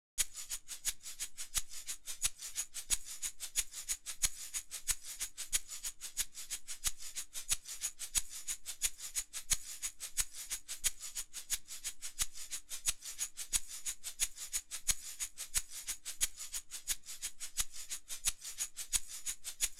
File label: shaker